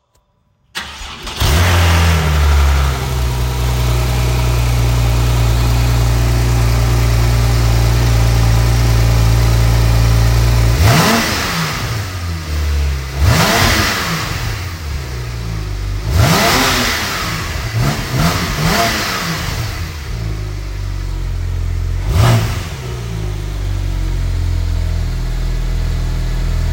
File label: porsche turbo; porsche 911 carrera turbo s